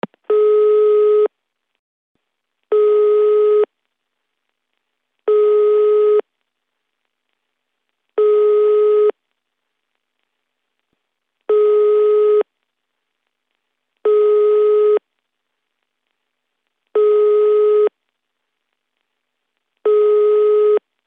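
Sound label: long dial tones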